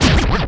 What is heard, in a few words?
CRAZY SCRAB, Techno Trance, Perc; E-MU Formula 4000 Series Vol. 2; OrbitPercMaster